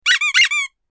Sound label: very funny sms tone ringtone